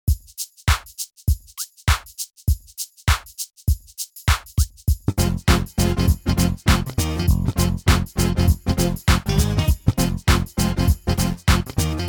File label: drumless